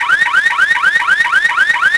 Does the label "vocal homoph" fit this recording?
No